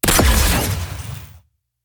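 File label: archet, impact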